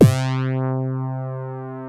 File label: SAW BASS; MORPHEUS